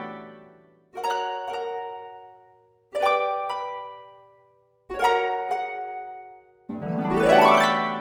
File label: harp